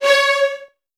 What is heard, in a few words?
C#2, Strings, VN 16TH; ILIO; Kirk Hunter